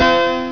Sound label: WIN START